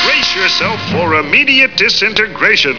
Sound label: pain; Alien Arena; marvinmartian